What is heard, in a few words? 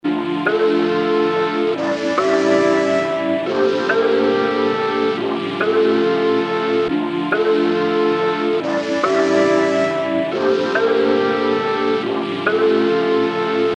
Melody Loop